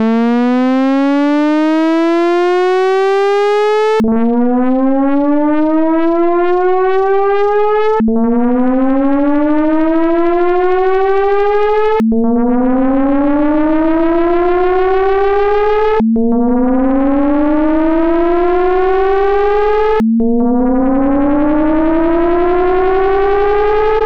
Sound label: delay fm stagger